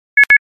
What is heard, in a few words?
dime tone; Phone Losers of America Media Pack 2017; PLA Ringtone Collection